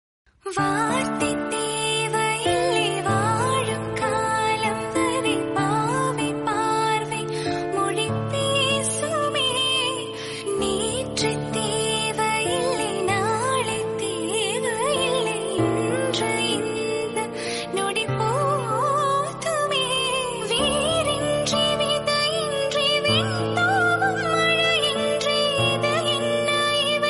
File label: Female Version, ringtone